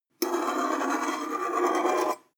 table scrape flutter; industrie wirtschaft; metall

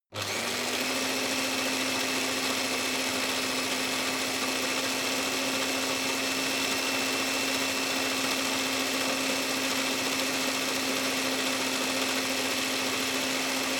electric whisk spin long; haus-garten; kueche

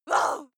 female lunatic shout short

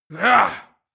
male fight; schreie; menschen; soundpool

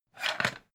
pan take slide; objekte; topf

